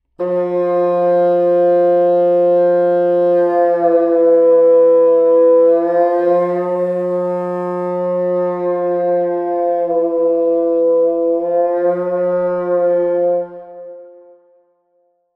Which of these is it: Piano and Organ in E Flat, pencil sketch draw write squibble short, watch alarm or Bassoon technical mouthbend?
Bassoon technical mouthbend